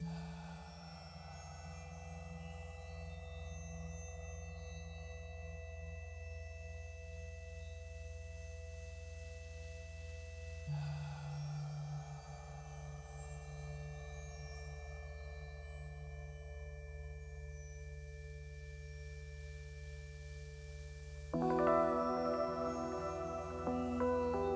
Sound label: Space Sax Ambience; Landinar Into the Void